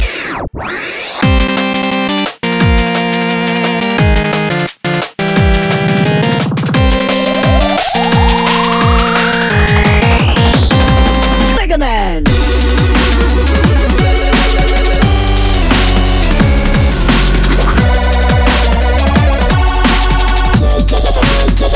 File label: 8 Bit